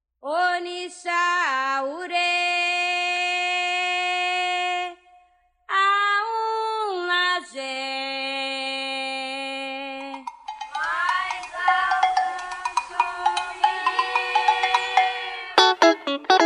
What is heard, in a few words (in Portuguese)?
pout pourri canto